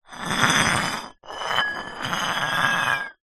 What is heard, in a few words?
glass bottle beer drag concrete ground; foley